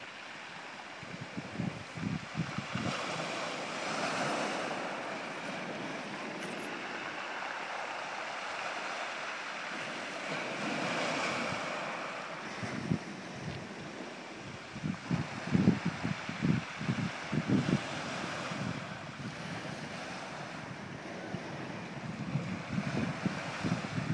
waves sounds at sheringham promenade

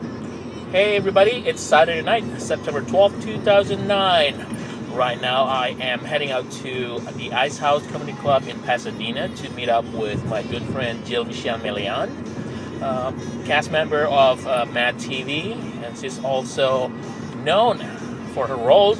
comedy club; ice house

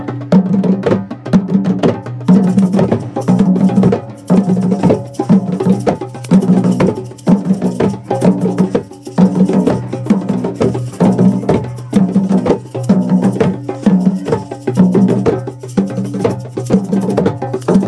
group drumming

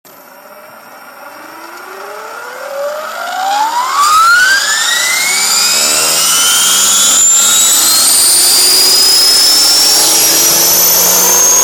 heli

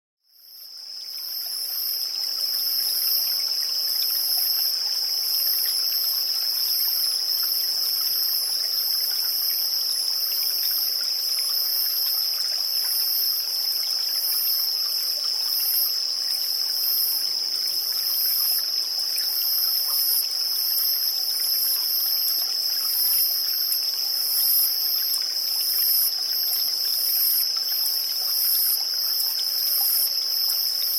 singing cricket

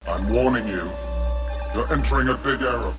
multiple film error; filmstorung; big error

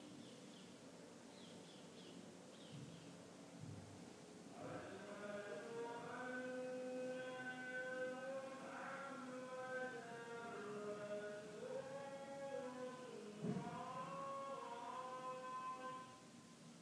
call to prayer delhi

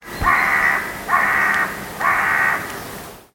crow; bird

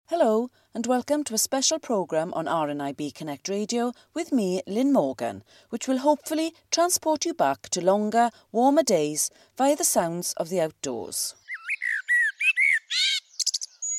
birdsong

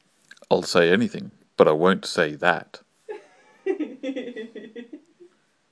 hurrrrr

